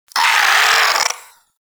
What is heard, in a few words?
Shadow Vocal Attack; Warframe; Duviri